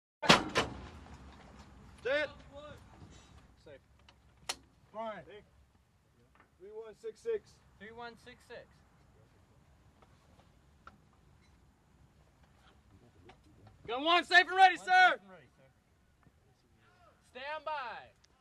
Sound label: Cannon 120 Mm Mortar, 120 mm mortar exterior; WEAPONS - CANNON 120 MM MORTAR: EXT: Loading orders, crew`s voices, fire